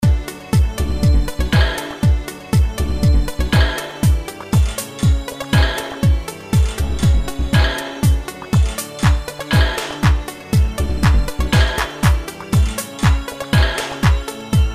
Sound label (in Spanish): tono chinesky real